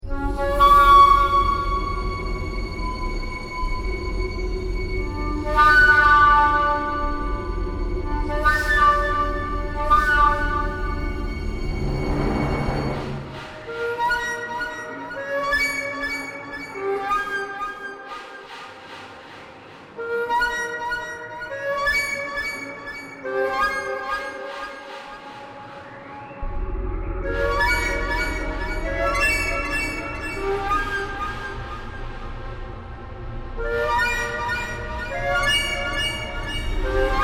flute extended techniques; elastic sounds

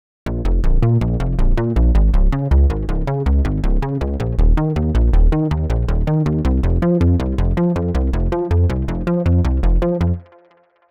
delay bass; the analog sound waves

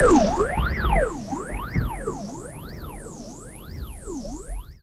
phasy siren thunder lightning; ambience and background fx; nova sound

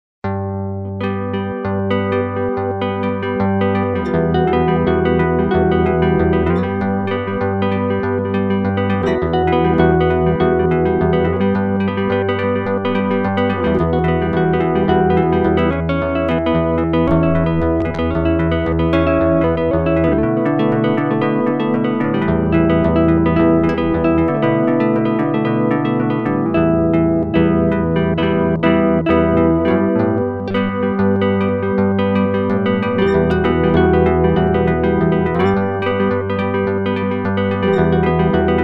guit middle

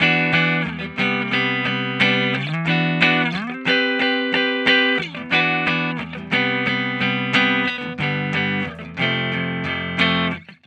guitar chords dry no airspace; airspace hybrid reverb and delay; modeaudio

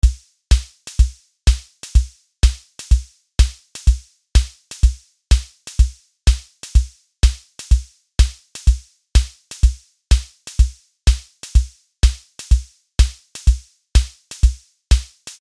swing

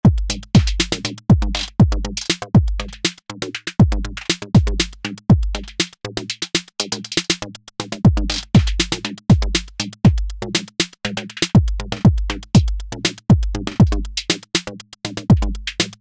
drums

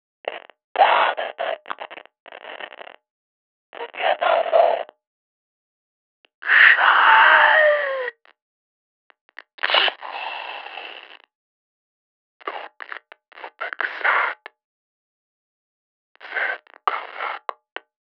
radio whispers, dark whispers; szepty radiowe; pssst instruments

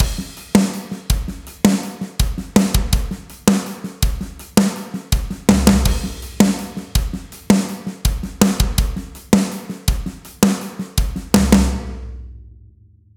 metal kick; drumforge

